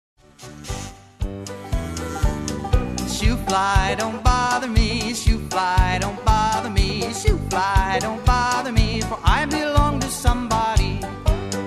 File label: karaoke vocal; childrens favorite songs; disney